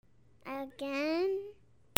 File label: PEOPLE